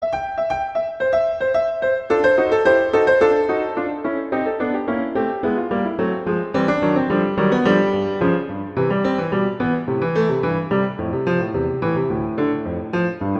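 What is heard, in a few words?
Allegro, Sonata violin guitar; Debussy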